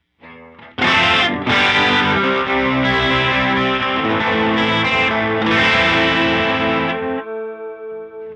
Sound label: Classic Chorus; Moogerfooger MF 108S